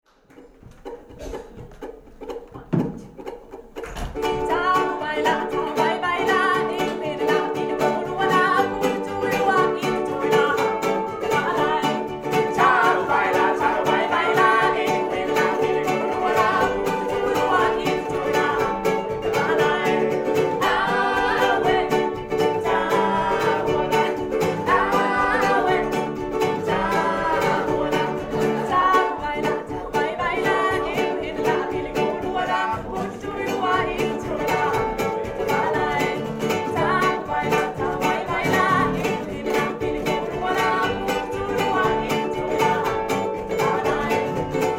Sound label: Hawaiian war chant, ukulele